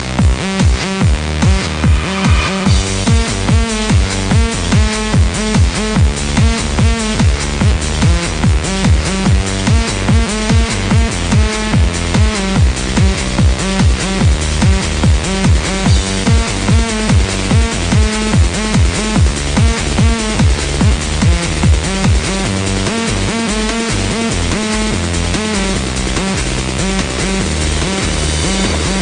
Ringtone

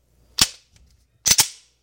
Smack Rack

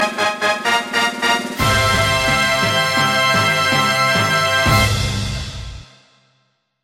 Fanfare; Dragon Quest

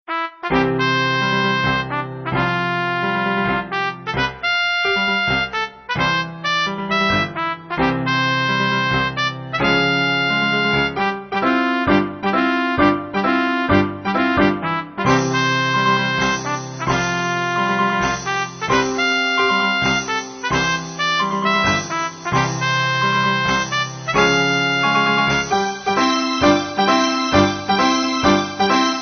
Trumpet

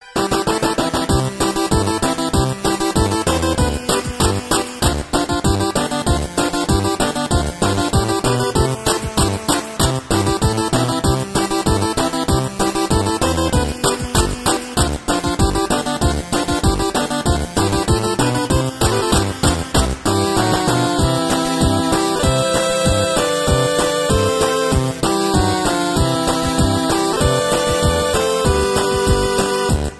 8 BIT

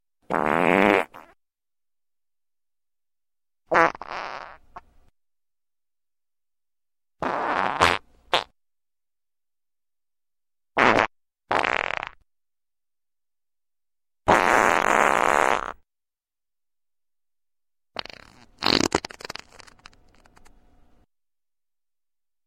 Fart Noises

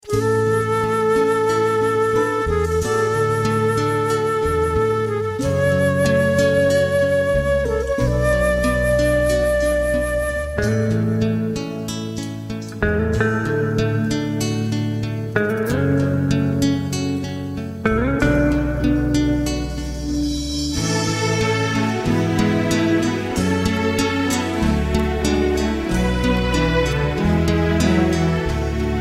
Flute And Guitar

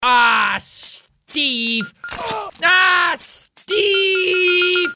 Ahhh

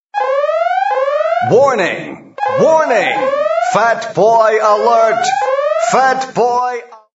Warning

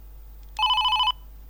Siemens x45 Ton

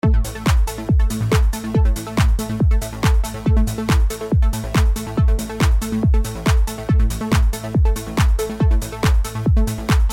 Trance

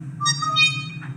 door bell; Orville

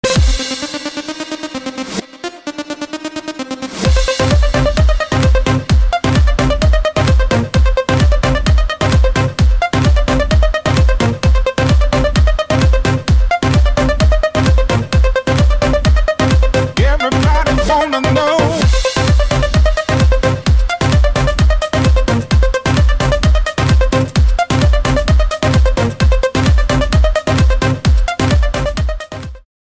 Dance Music

